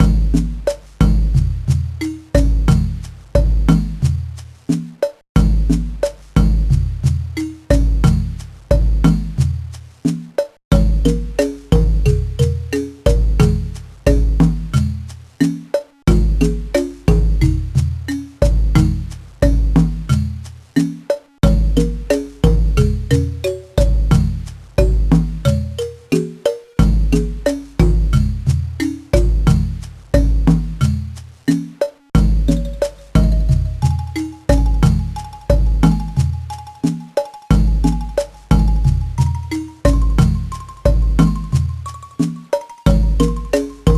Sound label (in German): Musik